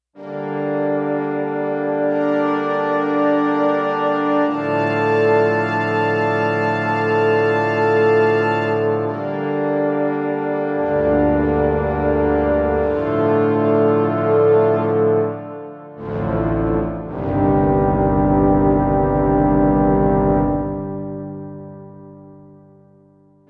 MINOR ATMOS; Digital Synthesizer

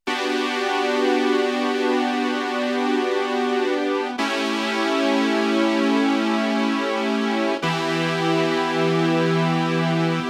Ana Brass Lead

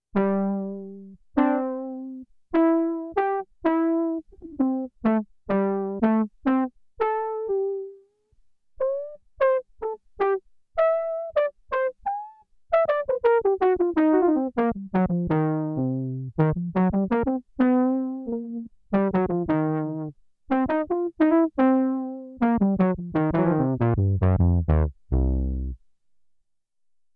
TROMBONE; Factory

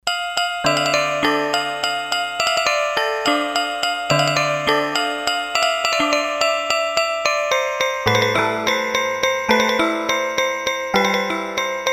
Hammer Bells, Roland D-10; Factory Patches

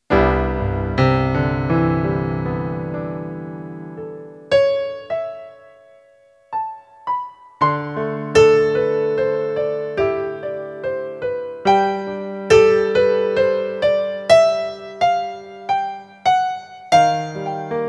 Grand Piano

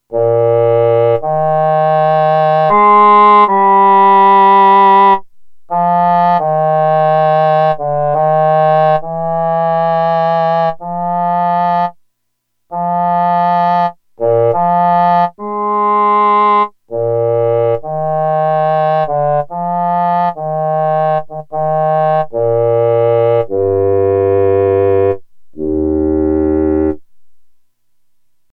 English Horn

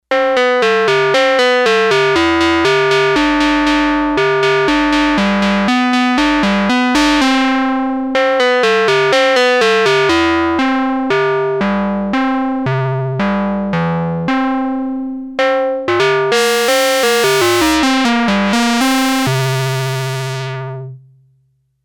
Klang Bells, Arturia MicroBrute; Factory patch sheets

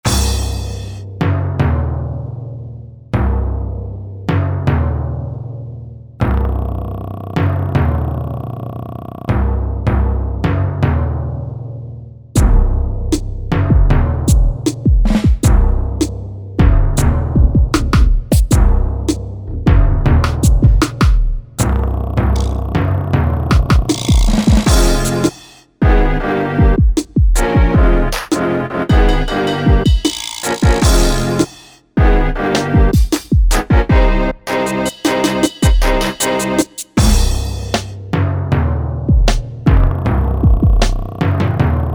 Swing Hop

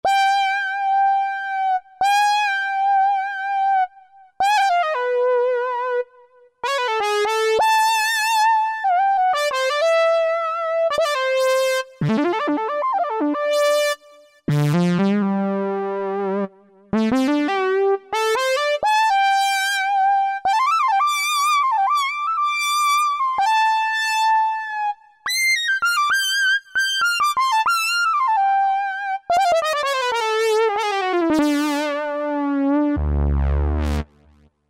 Mini Lead 1, Vintage Synth; Roland SR-JV80-04

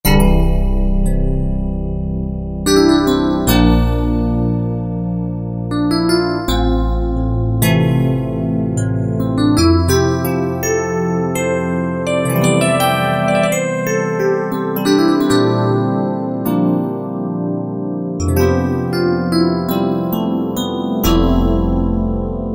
Crystal Rhodes, Roland JD-990; Factory Patches

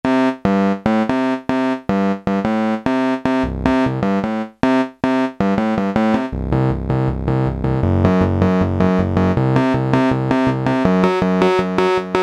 Yamaha PSR-300 SYNTH REED; Voices

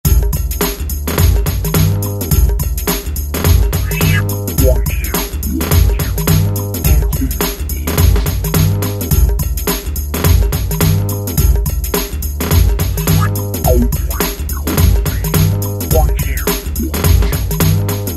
B-Boy, Korg ES-1 Factory Patterns

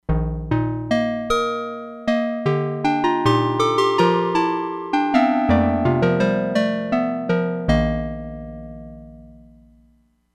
DIGITAL BELL LOW, Crumar Bit 99; Factory Programs

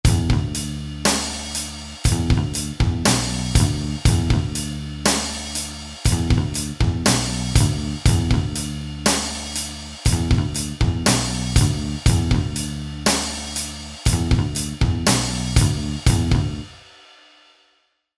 Metal

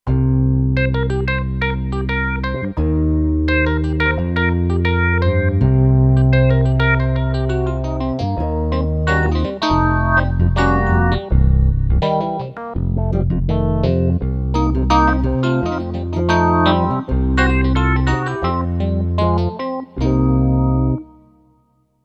Fusion Split, Kurzweil K2500X

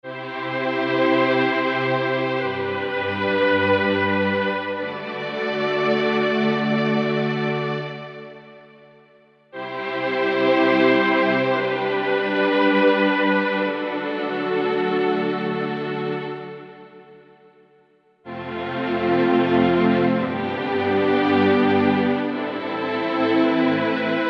S Tron S Tringz, Roland SRX-07 Ultimate Keys

